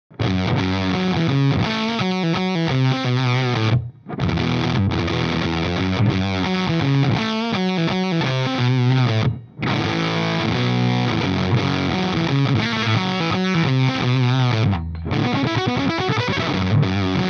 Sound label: FUZZ